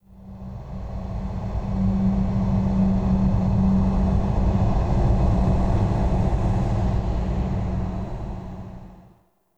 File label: Exterior Eerie, Ambience; Warframe; Duviri